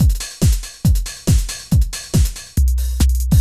techno 140

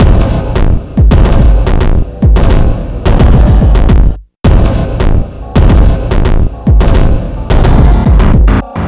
EXTREMELY ABRASIVE BEATS

